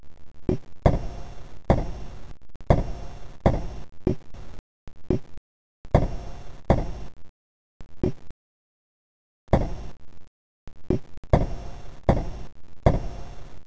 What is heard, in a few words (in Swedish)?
hack i skog; ambience; svencoop; aomdc